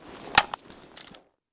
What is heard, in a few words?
clip out, f2000